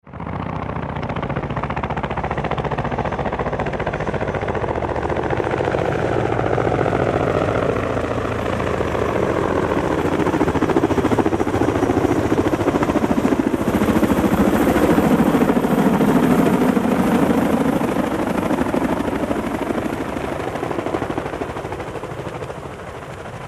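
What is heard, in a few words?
AH1 Approach By Away Slow, AH-1 Cobra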